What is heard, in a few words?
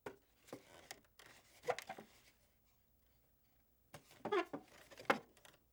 Wood Chair Movements; Fairlight Sound Lib